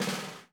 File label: BUZZ, 30S JAZZ; Northstar - New Gold VOL-1